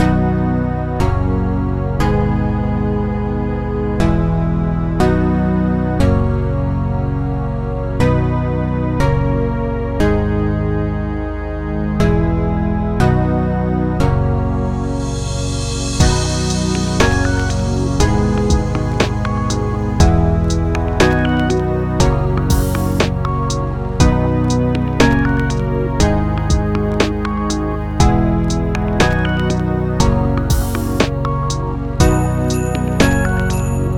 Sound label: jingle